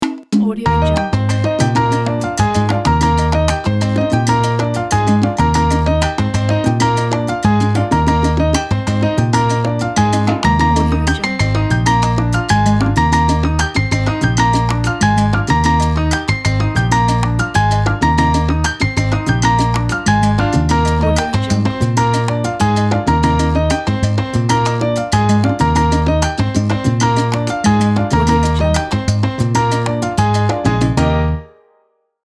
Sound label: salsa; cali